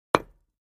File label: Patio Block Hit Sledg, concrete & brick